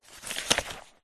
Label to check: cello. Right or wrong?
Wrong